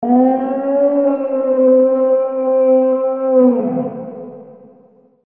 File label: howl mono, scary sounds